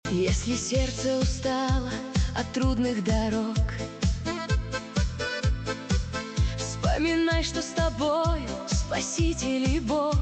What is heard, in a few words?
worship